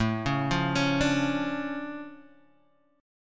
D Minor Major 7th, A, guitar

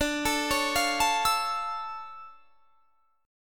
D Minor Major 9th D down; DmM9; arpeggiated; JGuitar

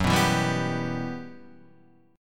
E Minor F sharp, chord; Em/F# Chord {2 2 2 0 0 3} strummed

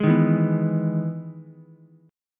E Major 7th E down Ukulele; EM7 strummed; JGuitar

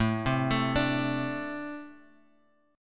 A Minor Double Flat 5th A, Guitar, Open G

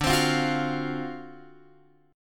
D 7th Sharp 9th D, Guitar Open F; D7#9 strummed; x,9,12,12,9,12

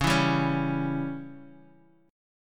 C sharp Diminished C sharp down Guitar Open D

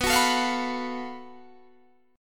Gb Minor Major 7th Double Flat 5th B

